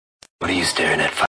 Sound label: dramatic prairie dog